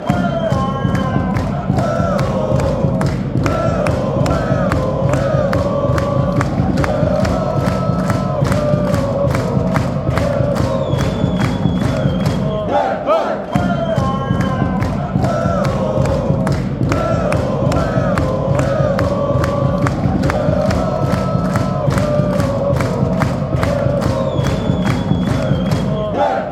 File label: ooo ooo ooo black white, chants; international